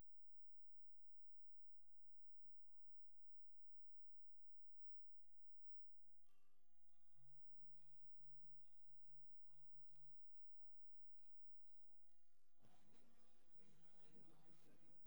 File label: bass stem